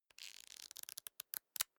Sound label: small book riffle through